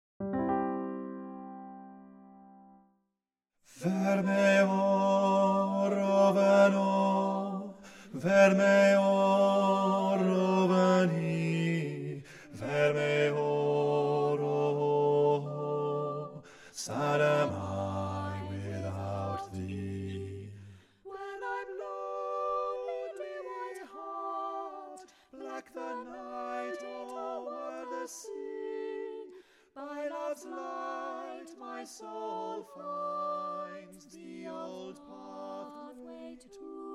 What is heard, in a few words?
Eriskay Love Lilt BASS; church